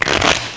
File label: Shuffle, poker; iPoker 2000